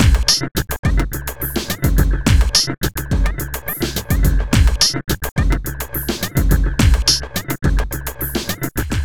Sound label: Beat, Drums & Percussion; Electrocution; Beats